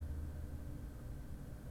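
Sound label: blower right, organs; E-MU Producer Series Vol. 5 – 3-D Audio Collection; 3D Pipes Dr Joyce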